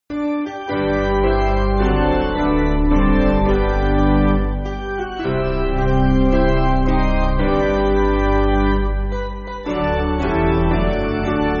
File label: Piano